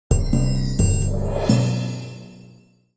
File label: TRANSITION